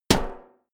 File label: step walk metal hollow; schritte